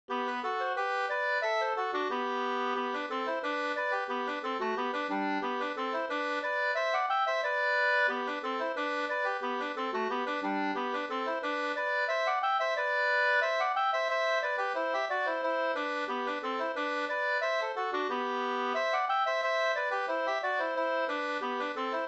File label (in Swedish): folkdans; Astorpastycket 2st